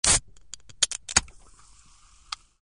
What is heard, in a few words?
Soda Can Pop Top